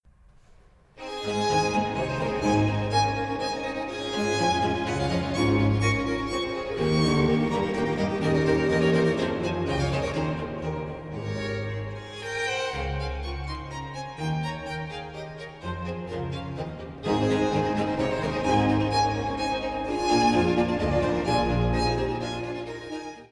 A major for 2 violins and basso continuo and organ obbligato Allegro